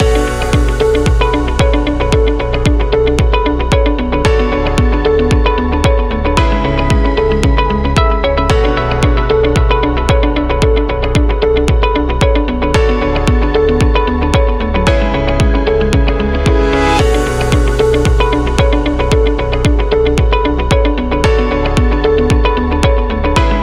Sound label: Medical Loop